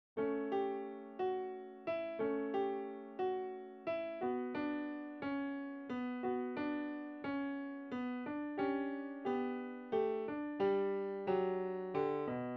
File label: sopranes